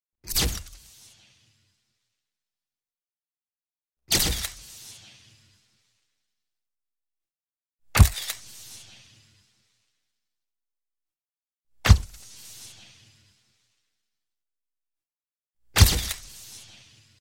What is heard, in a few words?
Camera Picture Shot with Electric Flash Tail; Hollywood Edge Sound Designers Tool Kit